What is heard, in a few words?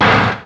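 Collision Interior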